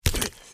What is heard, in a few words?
trog foot run, street trog